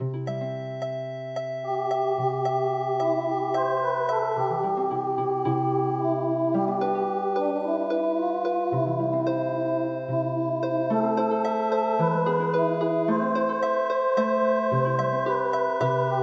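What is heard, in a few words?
hymn Healing Harp; midi; traditional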